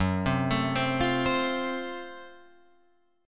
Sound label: Gb Minor Major 7th Flat 5th Gb Guitar Open F; arpeggiated; 6,7,9,7,8,7